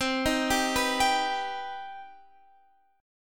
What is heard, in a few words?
C Minor Major 7th C Guitar Standard; CmM7; x,15,13,12,12,15; arpeggiated; capo=2